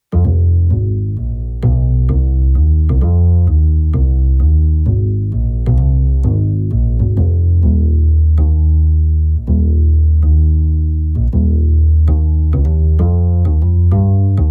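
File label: acoustic bass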